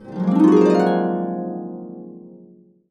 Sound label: Complete Harps; USB Soundscan vol.62; AKAI